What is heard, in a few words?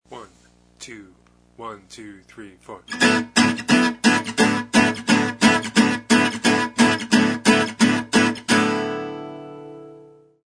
bad rhythm gallop